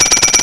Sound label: SHAKEN B, PERC